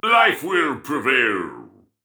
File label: Death; Paladins; Dark Lord; Directed Terminus